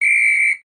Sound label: whistle; Mr. Bean - Backyard Junk